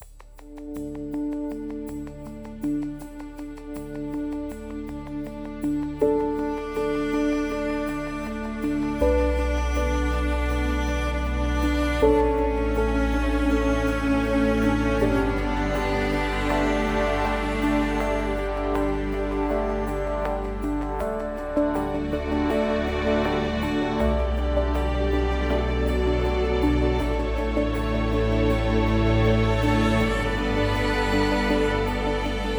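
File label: Music Orchestral, Orchestral Post Race 80bpm; Forza Motorsport 6 Apex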